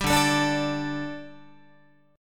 D-Suspended 4th Guitar, Dsus4 chord; strummed

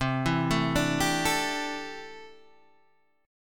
C 6th Add 9th C down Guitar Open D

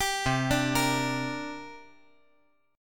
C 7th Suspended 2nd

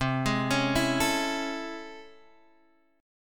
A Major 7th C; arpeggiated; JGuitar

